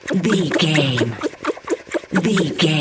cartoon squeaky cleaning loop

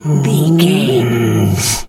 bear roar soft

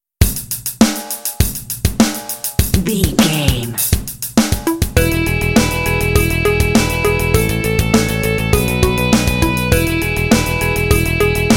indie yippie yey ooh vocals

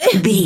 voice female hurt pain

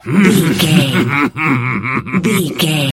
voice male laugh

